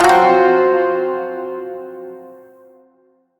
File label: Shawzin Hirajoshi Chord F; Chords; Warframe